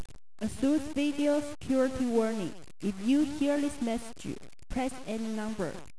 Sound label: warning; asus3800